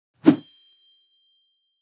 Bright positive transition

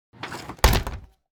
Airplane interior bathroom door close; vehicle

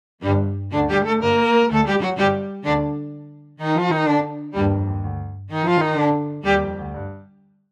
Game over you lose action arcade

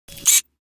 Camera point shoot zoom focus

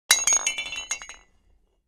Grenade drop hard surface; gfxsounds

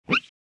Glass window cleaning

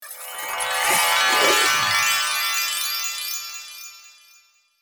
Magic wand sparkle

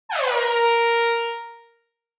Reggae air horn A3 sharp

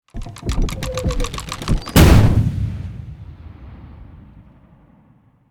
Pirate ship cannon crank and fire

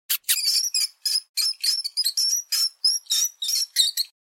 Mice chasing and loud squeaks

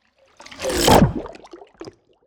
Cinematic water whoosh

